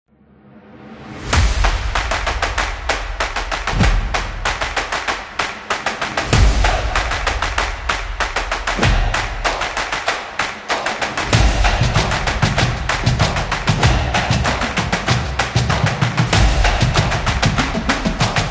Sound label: Sport Percussion